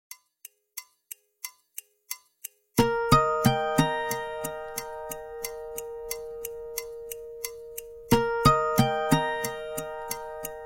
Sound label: Calendar alert, Nokia 6760 slide